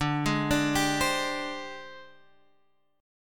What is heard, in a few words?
Ab Major 7th Flat 5th D, Guitar